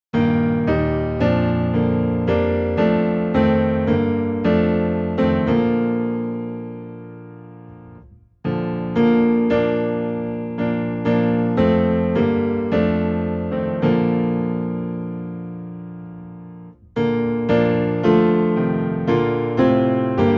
Piano Low in B Flat

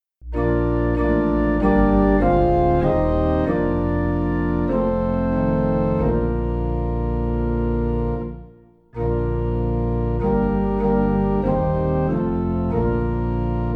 Organ Low in B Flat

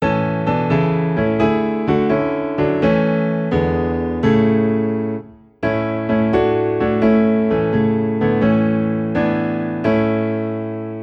Piano Low in A; Anywhere With Jesus

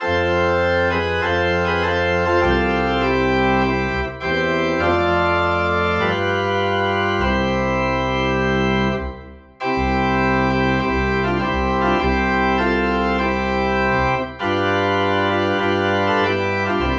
Organ in C

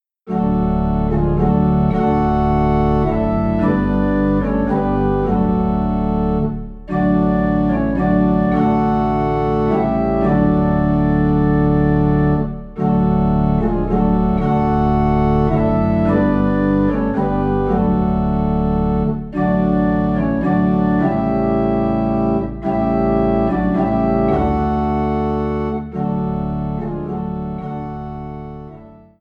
Organ Low in D; Jesus I Come

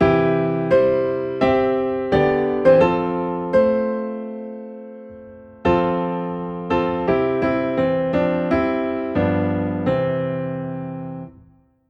Piano in C

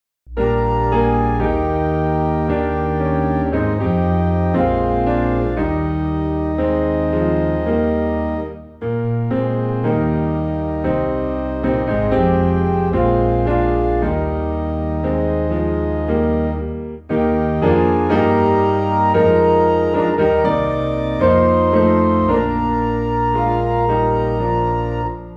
Piano and Organ in E Flat; Let Us Break Bread Together

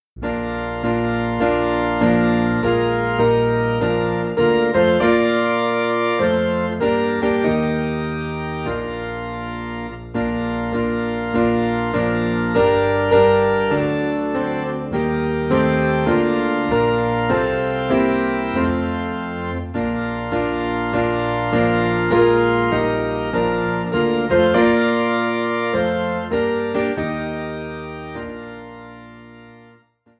Piano and Organ in B Flat; Hallelujah What a Savior

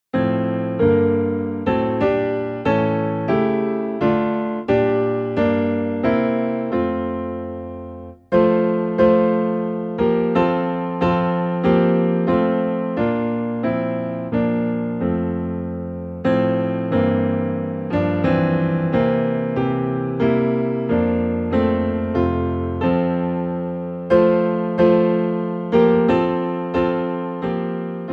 Piano in F

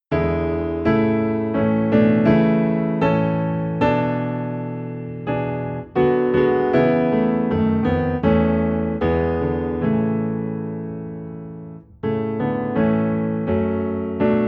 Piano Low in A; christmas; Thou Didst Leave Thy Throne

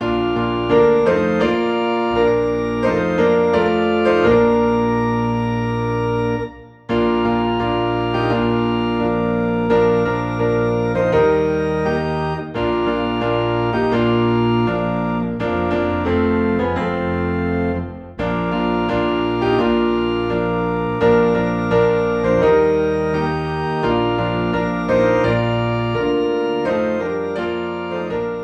Piano and Organ in B Flat

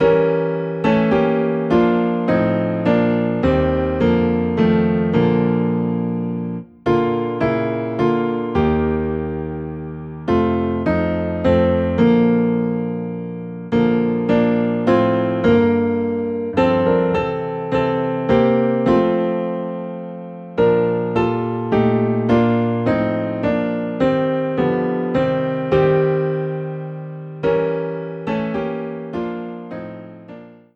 Piano Low in B Flat

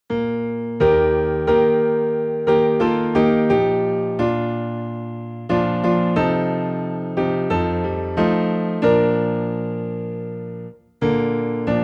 I Know Whom I Have Believed, Piano Low in B Flat

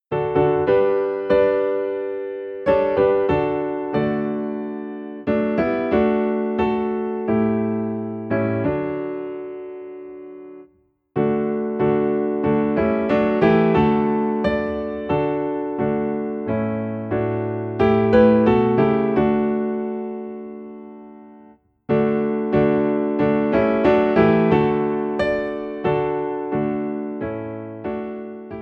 Piano in D

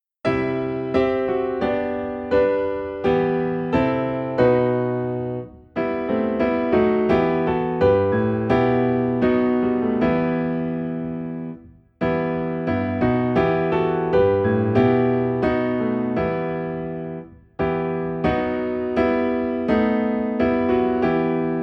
Piano in E Minor; Jesus Lover of my Soul

